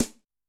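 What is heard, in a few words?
Snare Ambience, Snare; Yamaha DTXpress-II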